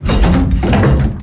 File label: Drawbridge Open; Doors